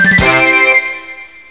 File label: tadah